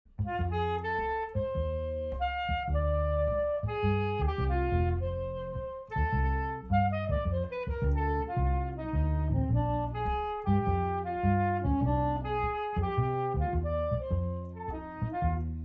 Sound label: Blues; 8M; mulleres noroeste